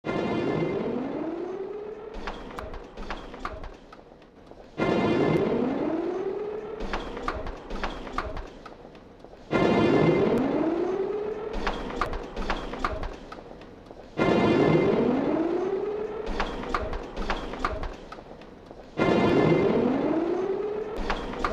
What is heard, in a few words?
karaoke busking